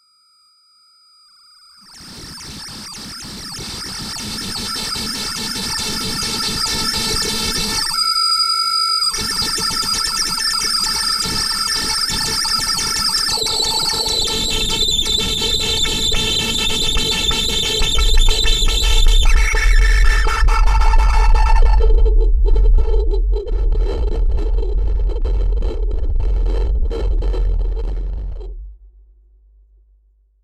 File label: Rua da Alfandega, Lisbon